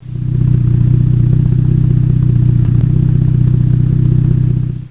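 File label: jaguar mk2 idle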